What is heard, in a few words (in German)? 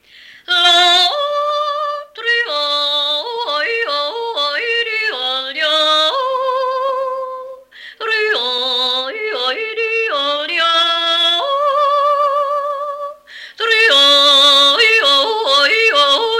Freier Jodler